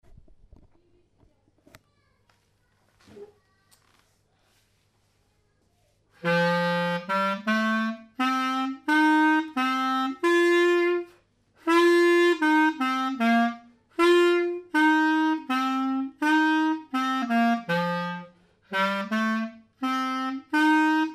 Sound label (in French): polka lent, clarinette; stg clarinette